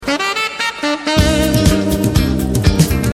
Dim Remin7

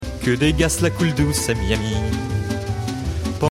Flute Suite

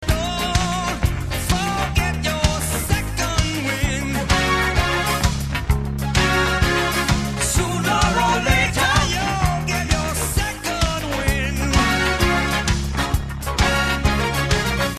Modulation

